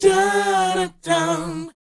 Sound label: BOYZ C#, Vocal Planet R&B & Dance; ILIO